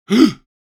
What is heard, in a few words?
Elderly man shocked gasp